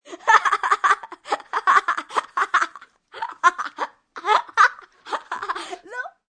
Laugh Hahaha, Laugh, 1 child, hilarious & long, human voice, kid; Laughter Sound Effects